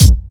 00s rich high end hip hop kick drum sound a sharp key